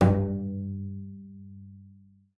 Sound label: BING G2, BASS FINGER; Xsample VOL-5